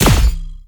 magic sword

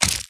atk ice prick

